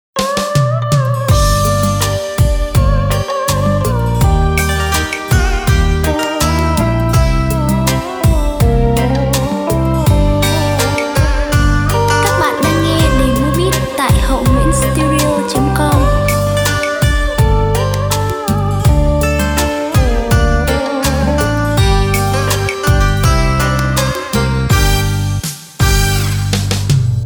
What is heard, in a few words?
beat, playback